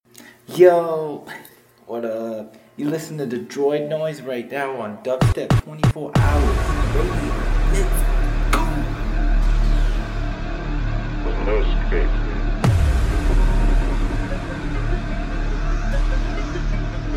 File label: DUBSTEP MIx; dubstep24h